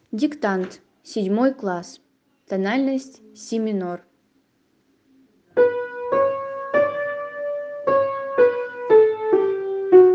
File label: si min7